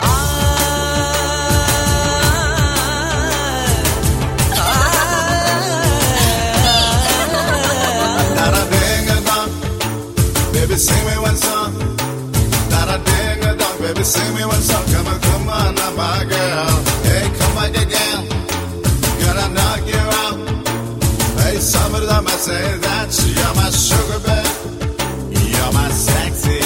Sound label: english music tone ringtone